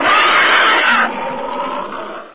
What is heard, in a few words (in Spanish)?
ruido monstruo; cstrike; deathrun jigsaw